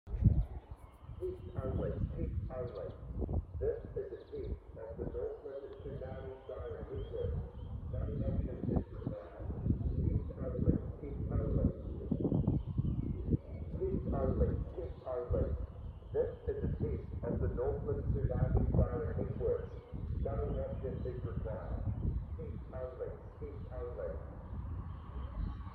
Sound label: tsunami siren test